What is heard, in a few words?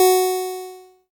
ELKA, String Machines; ELKA RHAP